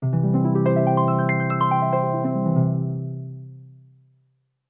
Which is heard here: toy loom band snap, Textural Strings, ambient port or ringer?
ringer